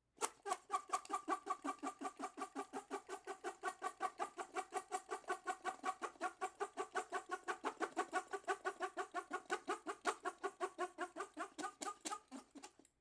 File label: Straw Squeak